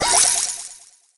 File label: emit powerpoints; Brawl Stars